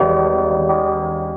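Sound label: KEYS loop R, Drum & Bass 175 BPM; Ueberschall; Drum & Bass Flash Trackz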